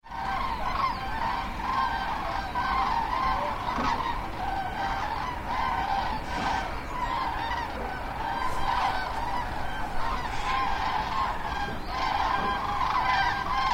Kranichzug

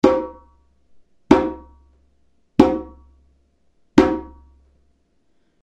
Djembe Ton

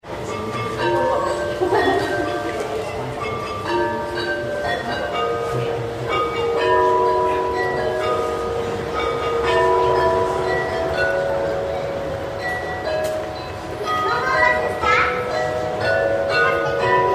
Glockenspiel Bremen

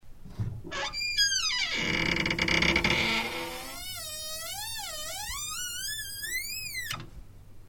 Knarrende Tuer

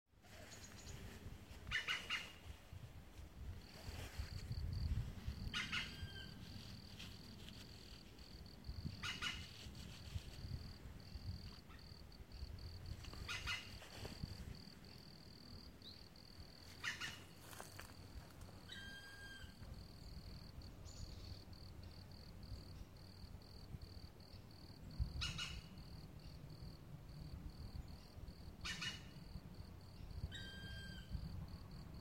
Specht ruft

